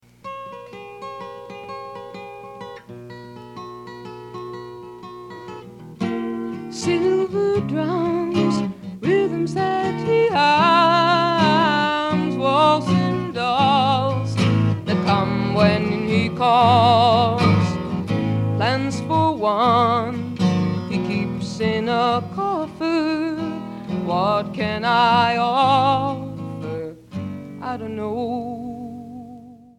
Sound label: drummer